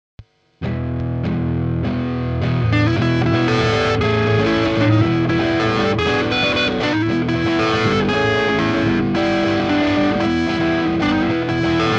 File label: Instrumental